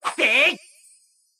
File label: coin; Mystical Ninja Starring Goemon